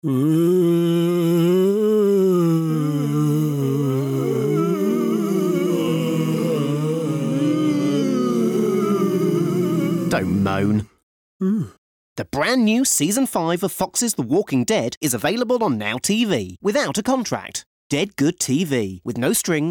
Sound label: Moan